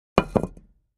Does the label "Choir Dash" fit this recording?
No